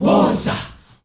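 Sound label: wosa group, group chant, Africa; Spectrasonics; Heart of Africa 1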